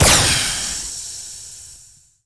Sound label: laser; Earth and Beyond; EA Games